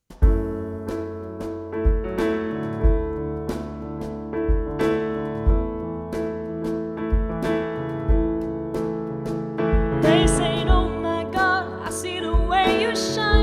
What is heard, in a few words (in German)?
Loungeband Live Piano Drums Gesang Band; Hochzeit; Hannover